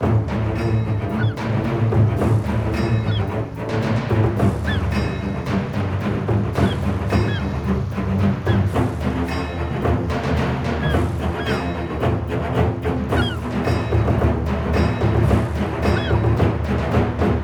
Bonus Loop Intro; genesis; jasons Quest

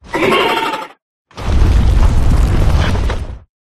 bonus giga ranged; Recode; rampage riches

